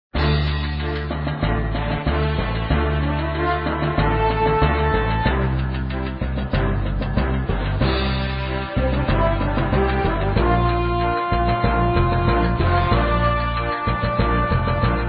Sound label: power